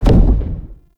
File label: Casting Device Impact; Weapon Impacts; Player; Casting Devices; Galene Casting Device